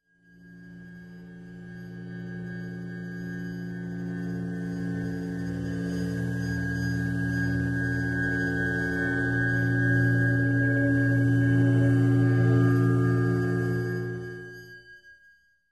Weird Glassy Horror Riser

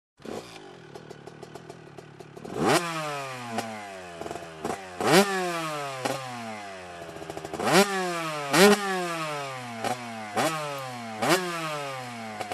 Offroad Motorbike engine sounds

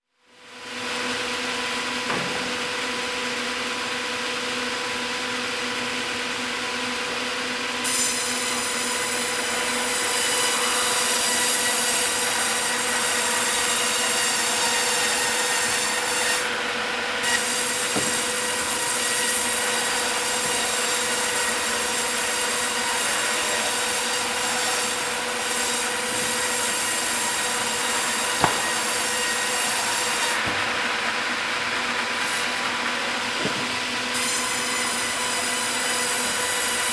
Sawmill

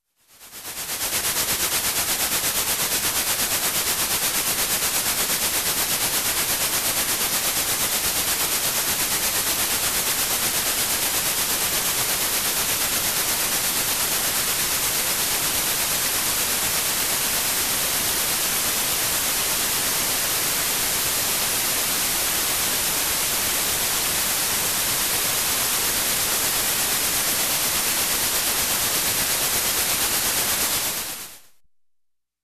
Train Chuff and Steam Noise

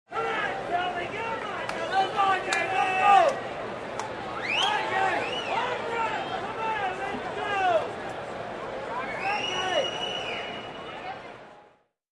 Crowd shouting and encouraging

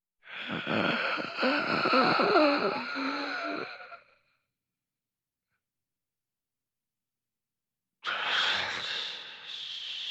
Difficulty Breathing

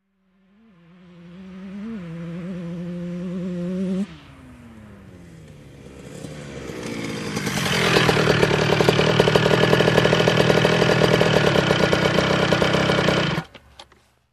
Motorcycle approaching and stopping

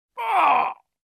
Person Grunting

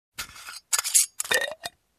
Putting Ice cubes on a glass

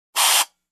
Tire Pressure Hiss

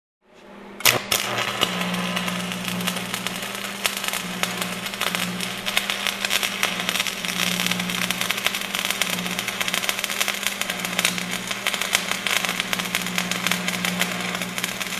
Construction

